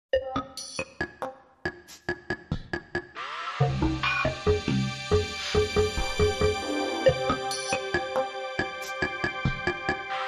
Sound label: Disjointed Neutral Percolating